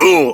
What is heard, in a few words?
Pain, Barks; Kuva Lich; Persona D